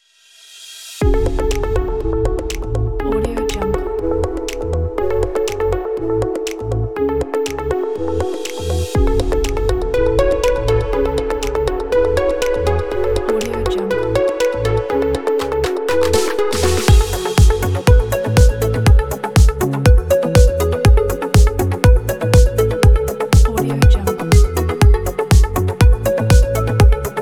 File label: the deep house